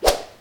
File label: Whoosh Swish